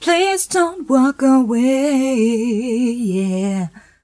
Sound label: f mel g, fem Vocals